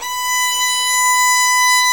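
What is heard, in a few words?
violin tenuto B 4; AKAI S-Series CD-ROM Sound Library VOL-7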